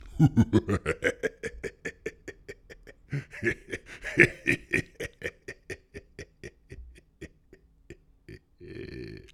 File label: Devil Laugh